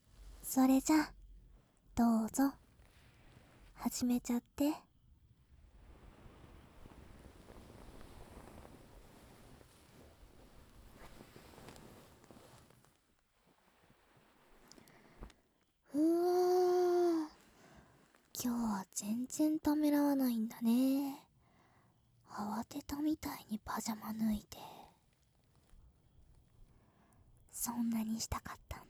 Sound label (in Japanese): オナニー鑑賞 耳舐め付, ASMR; 添い寝フレンドが手コキフレンドになるまで; Hシーン回想